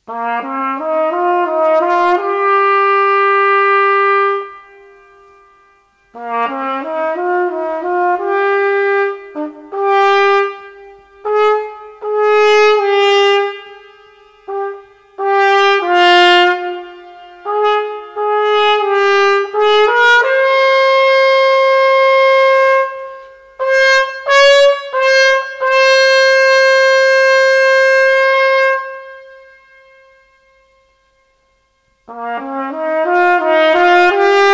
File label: Purple Shade Trumpet NO PIANO; Grade 3